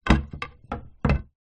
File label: sport snooker cue set down on table; zapsplat